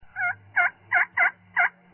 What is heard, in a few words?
yelp